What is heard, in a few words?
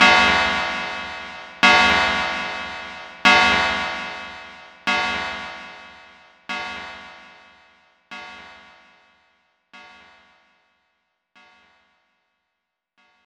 Bells; Mamono Hunter Youko; Genesis